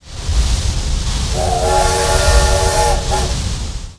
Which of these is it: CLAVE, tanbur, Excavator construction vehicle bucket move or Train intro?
Train intro